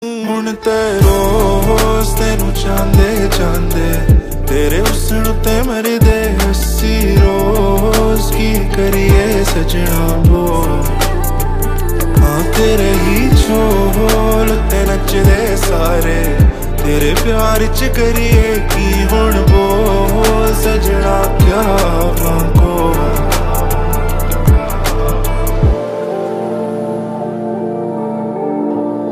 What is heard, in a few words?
Ringtone